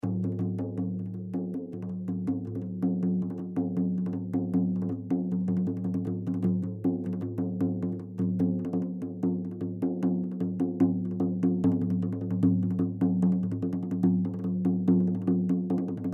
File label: Frame Drum Rhythm; Hit; Blastwave